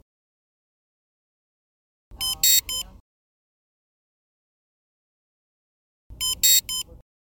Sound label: alarm audio